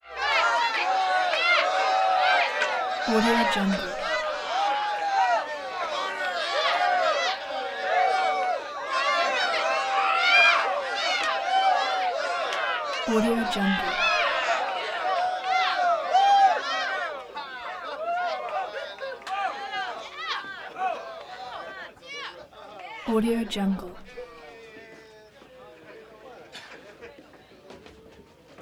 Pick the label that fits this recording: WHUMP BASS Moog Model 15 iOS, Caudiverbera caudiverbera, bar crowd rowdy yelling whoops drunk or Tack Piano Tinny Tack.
bar crowd rowdy yelling whoops drunk